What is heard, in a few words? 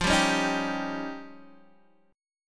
F 7th Suspended 2nd Sharp 5th F Guitar Open D